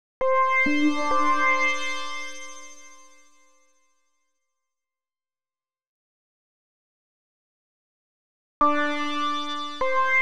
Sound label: Chorus Synth; Rock; Synths & Keyboards